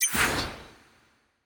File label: gambler enter; Beauty Fruity; wazdan; ultrafast